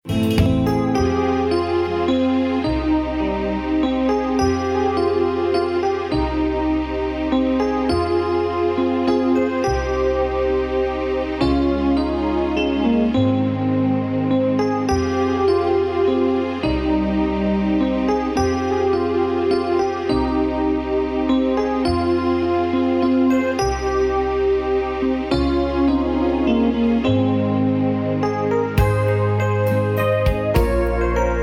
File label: instrumental; mrm productions